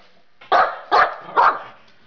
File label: bark, eskie